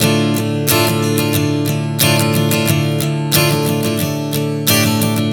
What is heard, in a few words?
Strum 090 Dm, One Chord Strumming 090 bpm; Acoustic Guitar